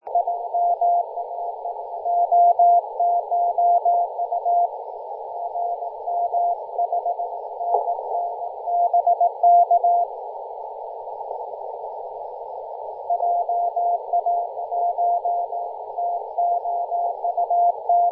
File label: 14.030MHz CW